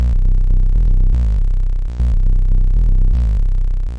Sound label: 120 deeply bassy reaktor drone